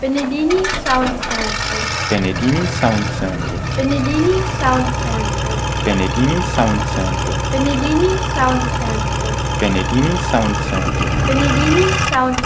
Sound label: FMC XM 474